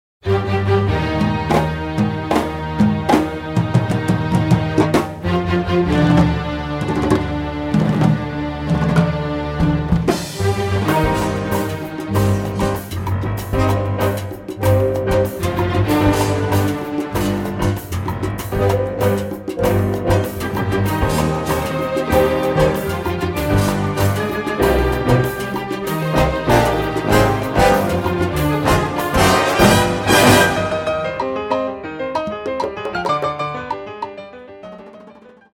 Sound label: Salsa